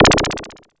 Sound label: BRIGHT, PERC HITS; Zero-G Ambient 1